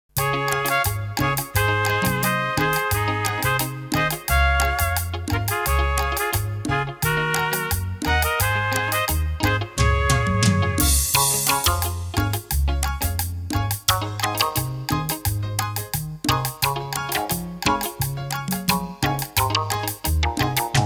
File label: cumbia instrumental